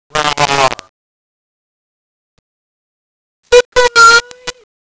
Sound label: rawr